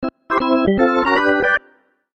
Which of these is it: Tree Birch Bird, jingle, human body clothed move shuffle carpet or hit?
jingle